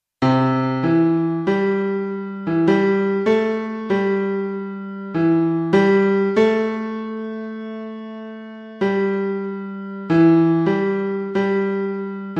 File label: Michael row boat melody